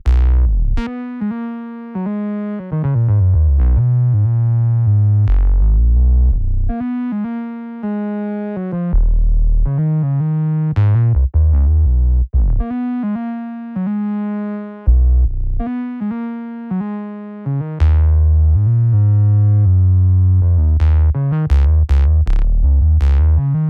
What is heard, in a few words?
bass, Smooth RnB, Ebmin; Big Fish Audio; Legendary; Happiness